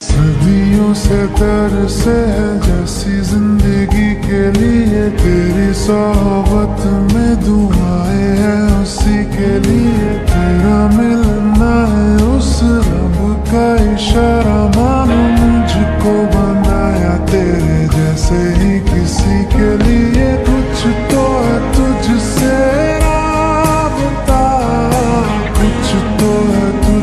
asthetic hindi ringtone

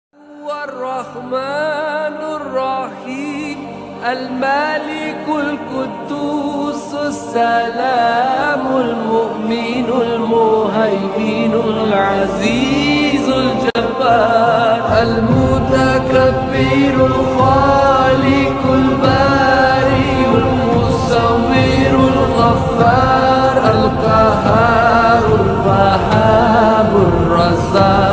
arabic ringtone; Names of Allah

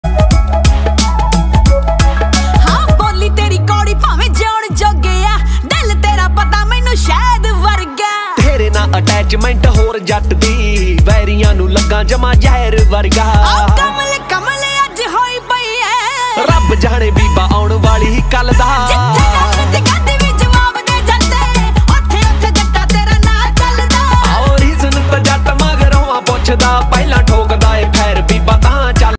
punjabi ringtone

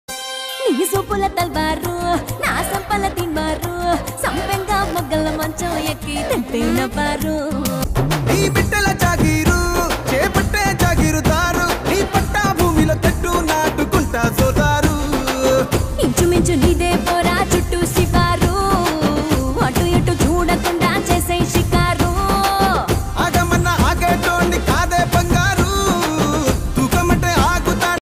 telugu ringtone

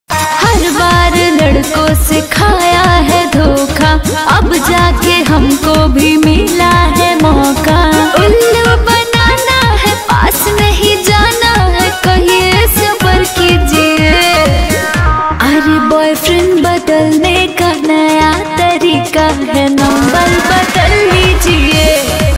bhojpuri ringtone

